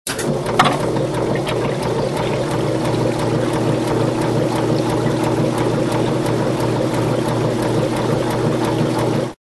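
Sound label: sounds of table plates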